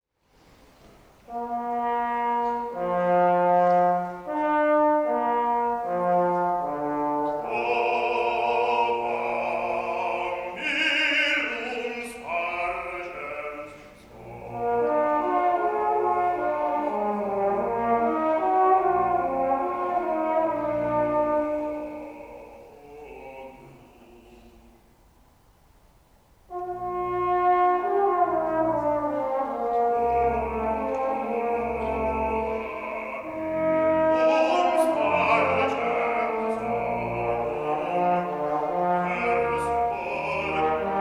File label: tuba mirum